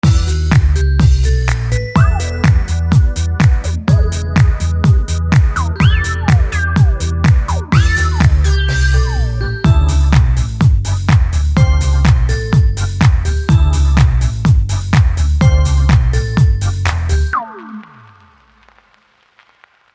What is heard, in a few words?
techno